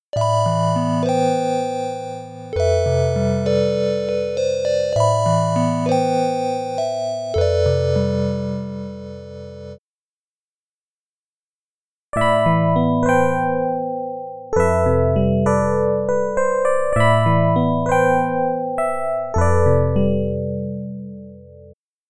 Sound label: piano